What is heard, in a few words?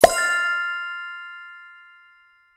open Prize